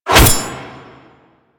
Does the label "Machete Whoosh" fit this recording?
Yes